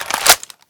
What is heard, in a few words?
mag in, weapons